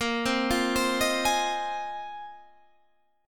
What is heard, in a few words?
A sharp 7th Suspended 2nd Suspended 4th, guitar chord; arpeggiated; chordshape; 18,15,15,17,16,16